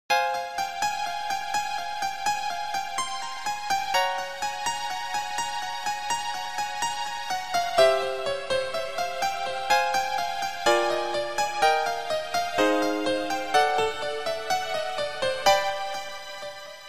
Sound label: melody; Slow Pop; Sad; MOD; slow piano ballad